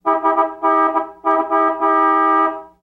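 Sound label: Buick Horn Many Short Honks, antiques; 1942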